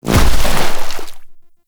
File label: Hoverboard Land Water C; Vehicles; Warframe; Venus Hoverboard